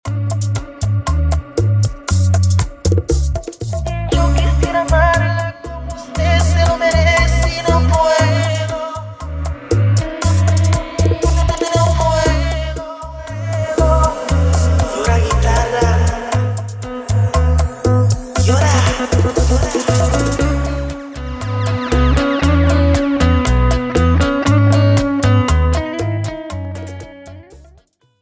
Intro Break Outro 118BPM

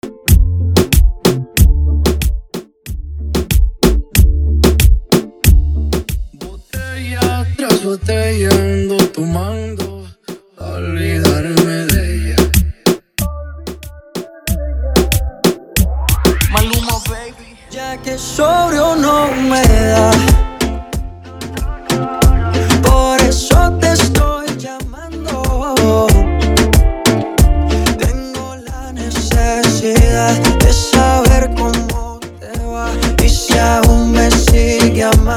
intro hype 93 bpm

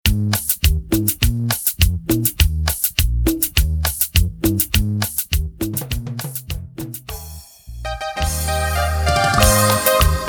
Intro Outro 102 BPM

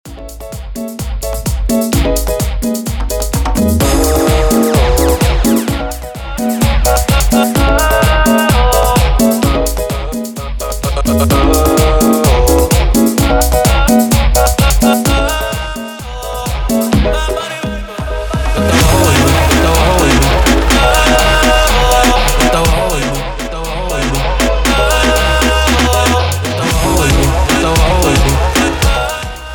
Transition Mambo To Reggaeton 128-102 BPM

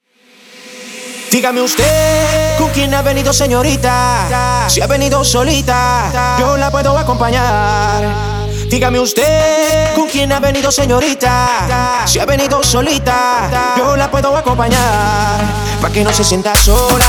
intro outro 130 bpm

